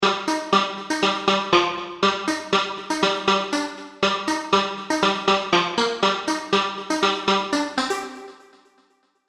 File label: vital presets; chiqui audio; vital plucks presets